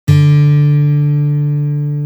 CHetvertaya struna gitary

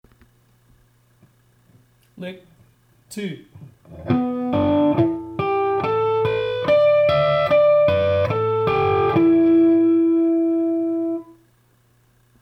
Naturalnye flazholety; uprazhneniya

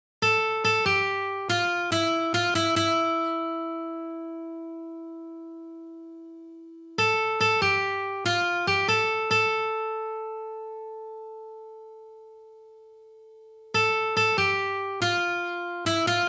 na gitare

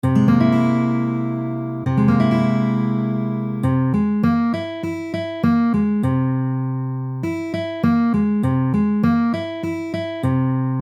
Septakkord C7

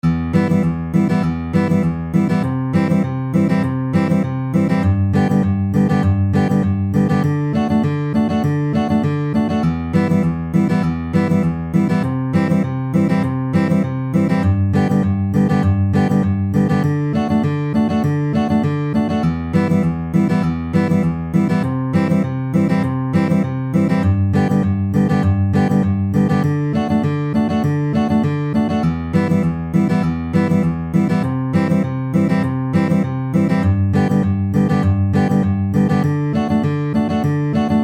Boj Galop s basom 100 bpm

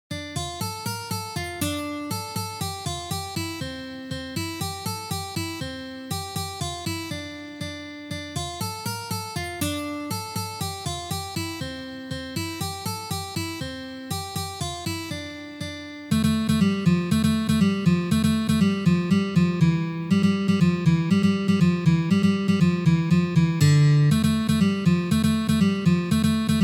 Lezginka na gitare

